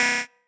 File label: marimba bike; ゆめ2っき